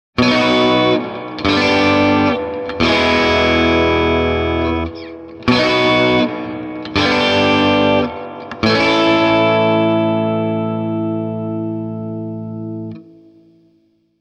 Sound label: tele, Swart AST